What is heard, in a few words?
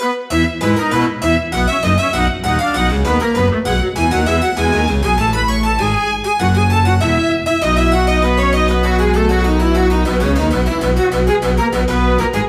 Symphony Orchestra, Harps; The Canterlot Symphony Orchestra; CSO; Harpsichord